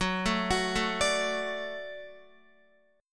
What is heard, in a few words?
F sharp Minor Sharp 5th F sharp Guitar; arpeggiated; JGuitar